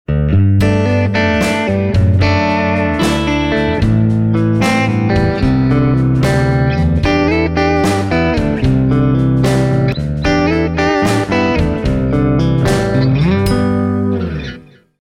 EPS520 Pro Steels Super Light Knight Tele Colts T Rex Replica Fender Deluxe; 9-42; Fender Deluxe Reverb; D Allen Colts; Dr J Sparrow